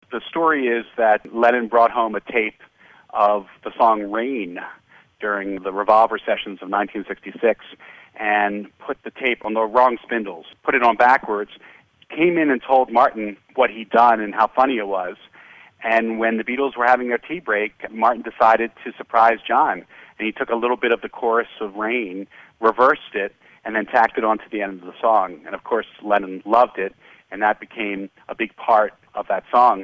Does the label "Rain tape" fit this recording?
Yes